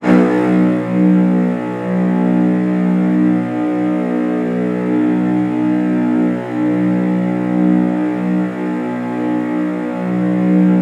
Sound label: CELLOS C#2, Synclavier Strings; ILIO